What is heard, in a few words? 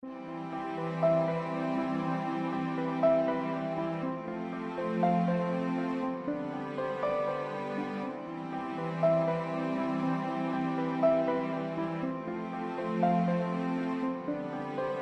Muzak